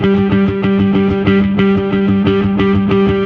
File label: Fuzz G harm